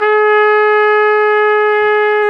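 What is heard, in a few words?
brass, Tpt f; Roland L-CDX-03 Disk 2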